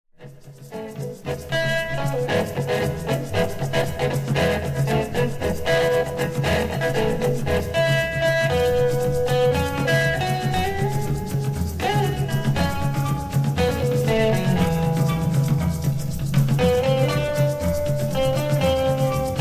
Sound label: Spanish guitar